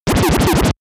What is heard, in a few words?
arcade intense play